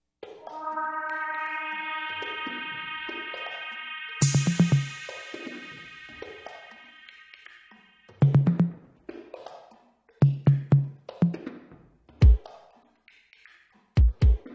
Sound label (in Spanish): musica de terror; creepypastas sin copyright